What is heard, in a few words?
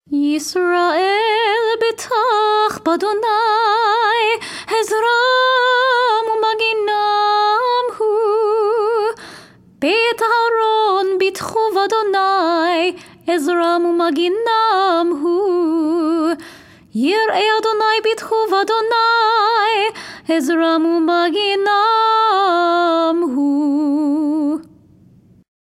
nusach ukrainian dorian; psalm 115 v. 1 11; Festival Shaharit (Higher Voice)